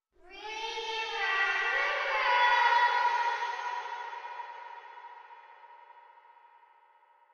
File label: Creepy Singing; silent hill homecoming 2008 gamerip pc ps3 x360